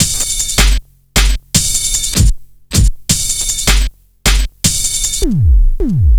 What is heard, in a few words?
155, breaks